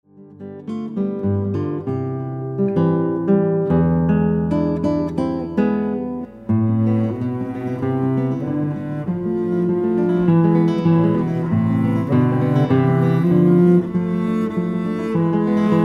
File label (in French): Tango pour Guitare et Violoncelle